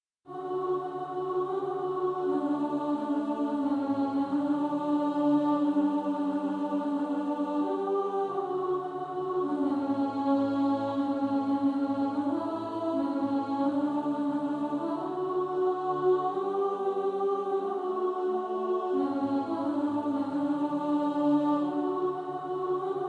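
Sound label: Who Will Follow Jesus alto, choir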